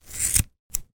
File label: cutting hair scissors single cut; foley; zapsplat